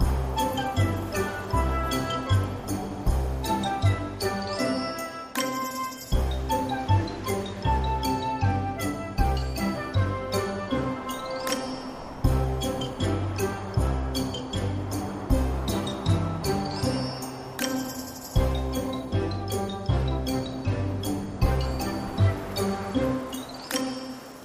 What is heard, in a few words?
bonus ambiance; Fishing For Gold